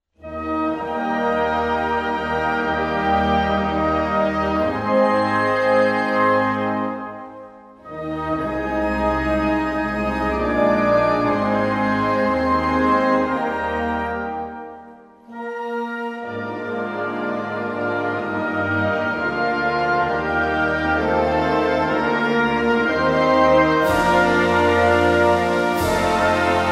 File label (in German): dänische nationalhymne